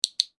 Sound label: click